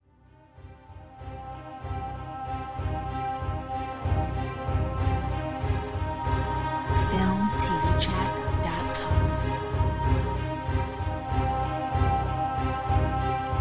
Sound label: A Powerful Story ethnic